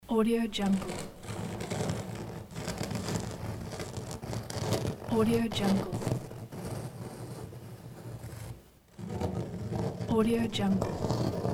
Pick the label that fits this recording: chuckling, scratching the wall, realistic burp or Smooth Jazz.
scratching the wall